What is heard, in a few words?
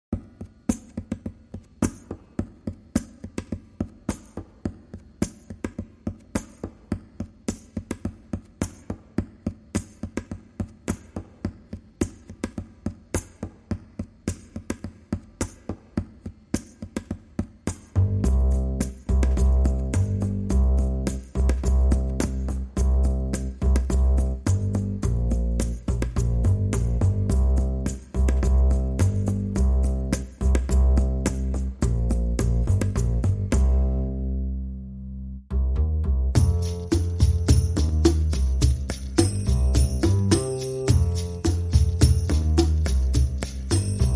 Drums and Bass